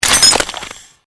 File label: Created Magic Spell Ice Bolt Impact